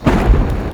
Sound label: attack; mahlendur